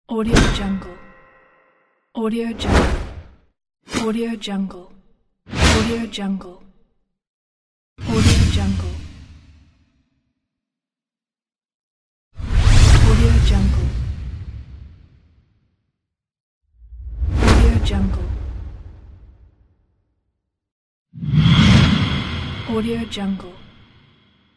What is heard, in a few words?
swoosh; oligzei